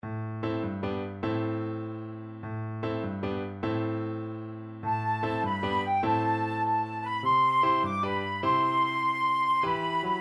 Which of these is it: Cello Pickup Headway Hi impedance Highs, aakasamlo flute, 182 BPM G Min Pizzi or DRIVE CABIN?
aakasamlo flute